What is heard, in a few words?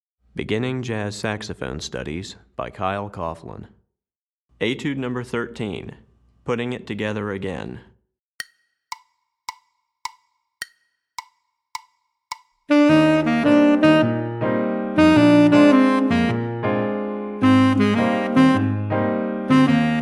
Tenor Etude, Saxophone; Etude #13; Beginning Jazz Saxophone Studies for Tenor, Volume 2